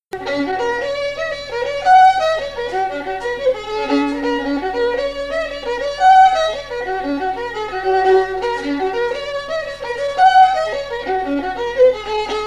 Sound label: fiddle; biddy the bold wife; SeamusGrantFestival